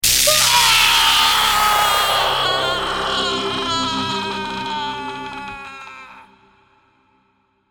Heat Ray Death, Agonising male scream as disintegrated by heat ray.; Battle